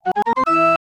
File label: notif song